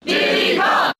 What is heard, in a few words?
Cheer; Diddy Kong; Brawl